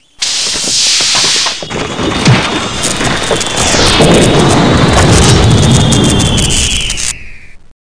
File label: battle